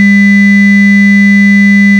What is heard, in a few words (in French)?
test filtre